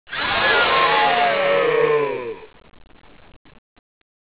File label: houndeye die; xash3d fwgs